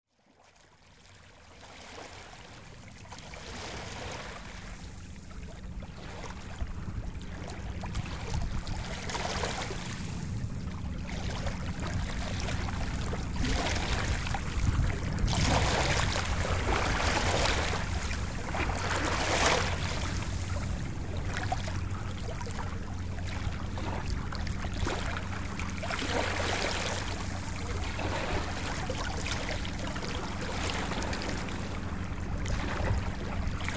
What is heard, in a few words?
Waves